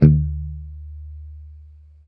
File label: E3 sus, Strat guitar; Spectrasonics; Hans Zimmer Guitars VOL-1; JC-STRAT MTE